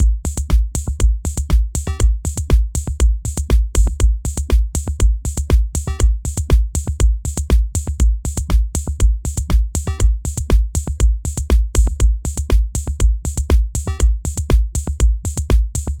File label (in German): Analoger Drumcomputer